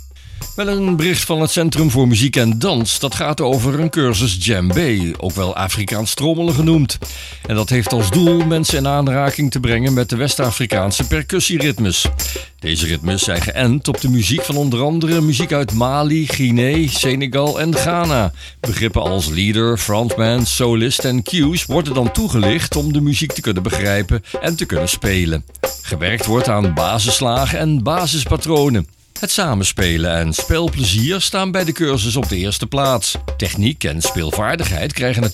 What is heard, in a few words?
djembe